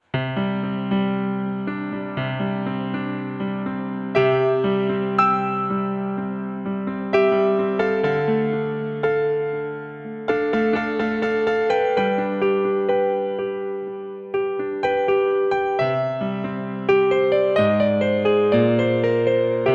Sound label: Piano